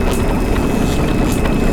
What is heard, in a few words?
Machine Generator Loop